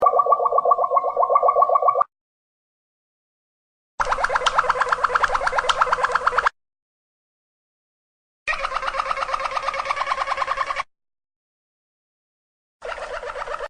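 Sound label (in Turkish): bollende, Malinua Kanaryası, Bollende, su kabarcıklarını andıran yumuşak ötüşlerdir. Kuşun boğazından yuvarlanarak çıkan bu ses, sakin ve melodiktir; Dinleyeni büyüleyen su efektli bir ötüştür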